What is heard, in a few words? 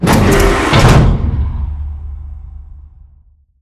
wall run panel move; beacon; Titanfall 2